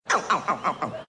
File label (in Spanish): risa flaco